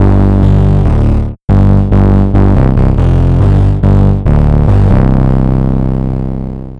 Nasty Bass

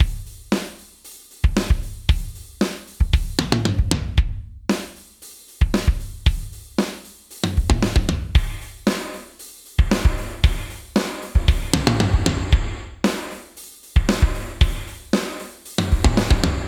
Gated 80s Drums Reverb

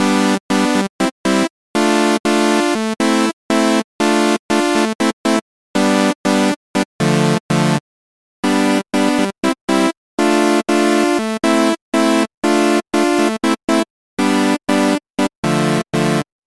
virtual synth miking

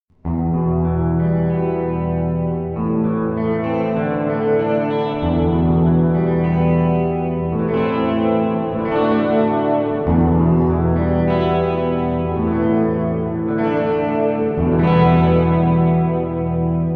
standard reverb

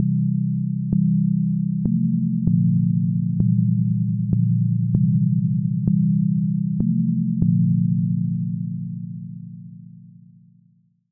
FM Panning

